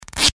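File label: adhesive tape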